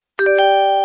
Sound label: message tone